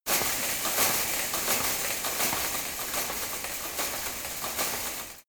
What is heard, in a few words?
metal fence rattle hard